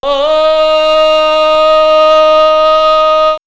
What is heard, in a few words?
Ethno Vox Islamic Prayer